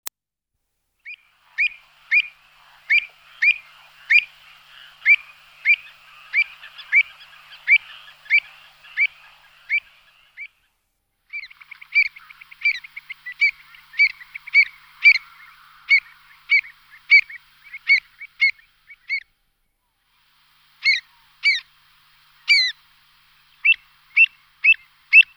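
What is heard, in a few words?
shiloklyuvka golos, pied avocet voice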